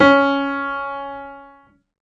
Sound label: Piano, Harmonic